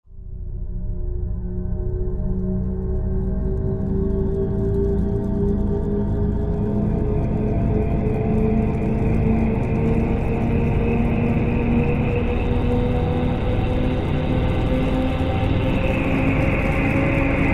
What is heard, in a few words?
Roland JV-2080, Dissimilate